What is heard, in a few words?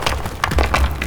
Footstep; FMOD Project; CaiStuff; Player